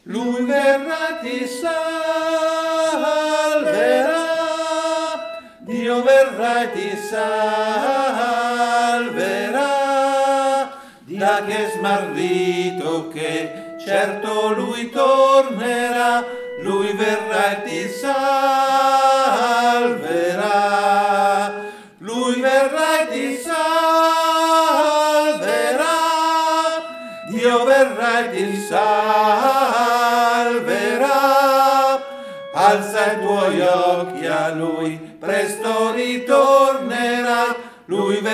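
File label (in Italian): tenori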